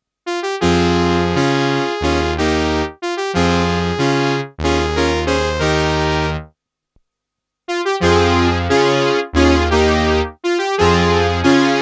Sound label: Juno60 Mono Brass; June60